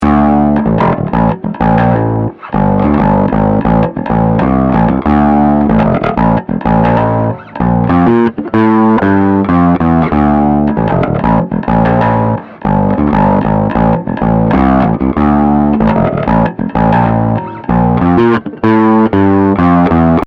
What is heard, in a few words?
Bass Tight Drive Finger; Amptweaker